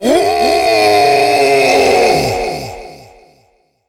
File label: big Win Scream; wildworlds